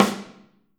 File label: Snare OH; Drums Percussion